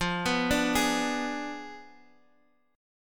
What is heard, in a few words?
F Diminished 7th Guitar DADGAD; x,x,3,4,5,6